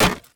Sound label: wood hit; Bad Piggies